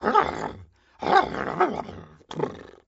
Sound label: horse long